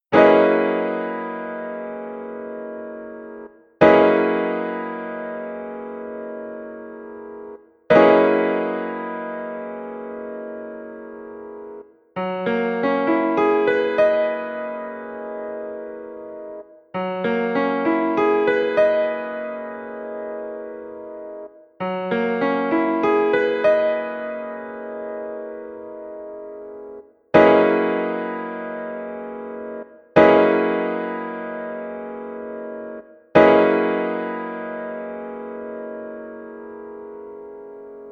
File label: Fis13 Piano Chord